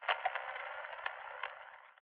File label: static; Titanfall 2